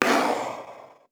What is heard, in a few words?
Hard Slide Decelerate